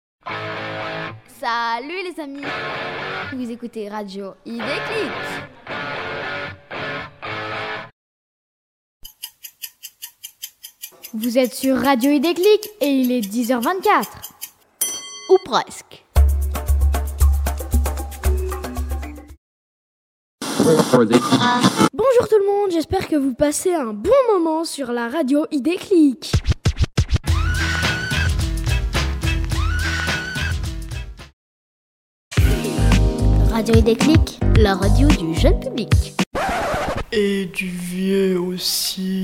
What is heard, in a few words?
JINGLE